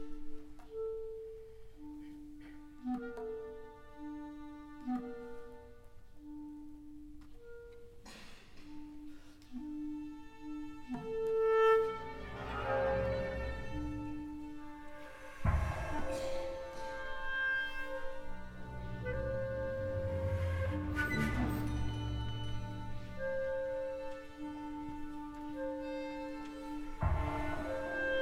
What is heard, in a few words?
Music for clarinet and orchestra; Elogio del horizonte